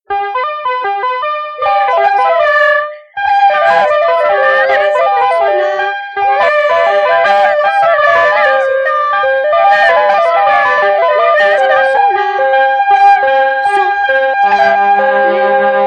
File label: Jingle